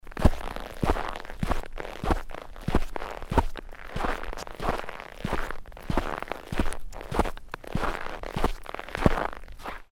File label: Footsteps Walking On Snow